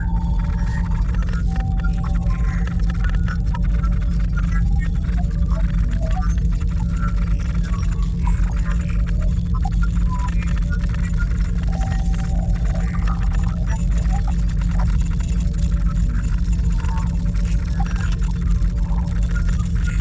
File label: controlroom, ships rooms; CosmicRage